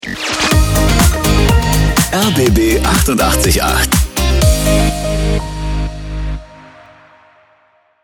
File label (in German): Transition Instrumental mit SV